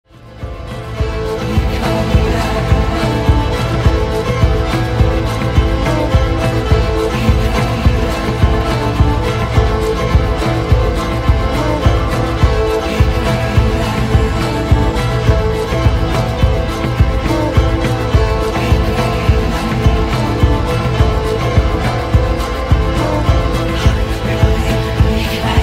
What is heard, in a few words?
Vikings war music